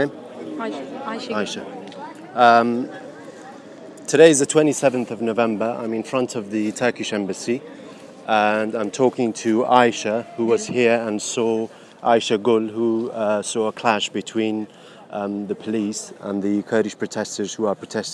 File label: clash between police and kurdish protesters in london